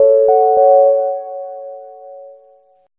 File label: Synth Shots; Vengeance Samples